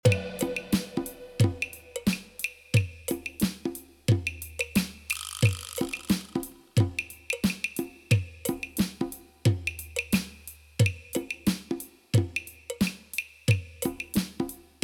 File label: Surfers Stream Loop Medley